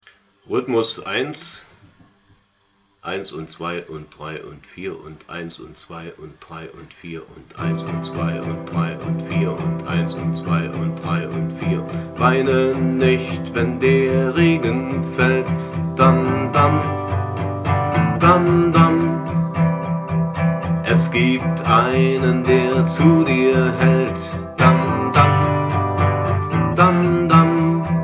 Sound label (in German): Rhythmus